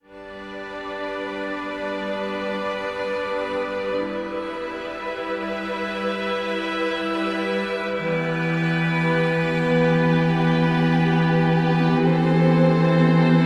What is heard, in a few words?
Relaxed Ambient